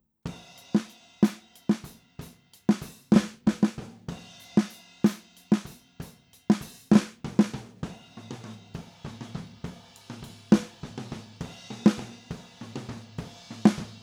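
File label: SM57 Snare Drums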